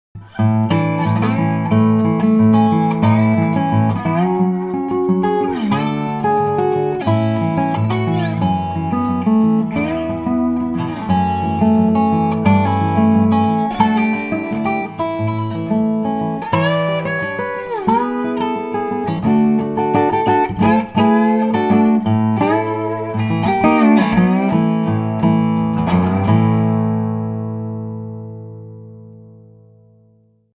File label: dobro